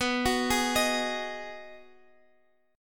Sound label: B 6th B down Guitar Standard; arpeggiated